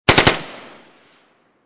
AK47 2 Burst Barrel, Machine Gun; Machine Guns; VRSonic

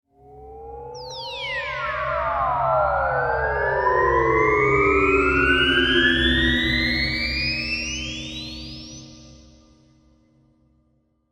UFO Ascend Synth, Scifi, Ufo, Ascend, Synth, Stab; Spacecrafts

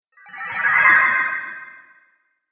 Spell Water Illusion, Magic Sound Effects

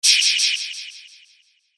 Hit Airy Flange Delay; Production Element Imaging Element Accent Transition

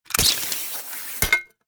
Warfare Grapple Gun Shoot Impact; Miscellaneous Combat; SFX Bible

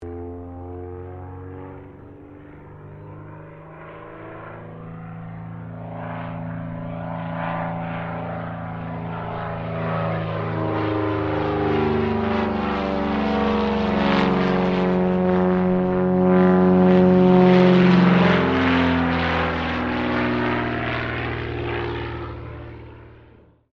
AIRCRAFT CT4A ROLL, military trainer aircraft; Airplanes; Vehicles

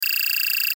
Text Readout Digital; Flash, Animation, Digital, Click, Message, Production Element, Imaging Element, Accent, Transition; Multimedia; Blastwave

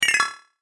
Game App Health Drain, Video Game Sound Effects

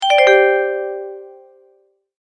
multimedia tone alert

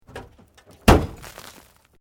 Metal Light Movement Minor

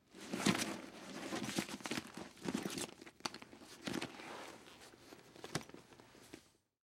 Purse Movement, Purse Leather Bag; Machinery Sound Effects; Machines; Foley; SoundEffects-Blastwave

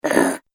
Goblin Gives Up; Monster Sound Effects; horror

